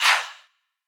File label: Processed Chant Breath, Vocal; Cymatics; Euphoria Vocal Sample Pack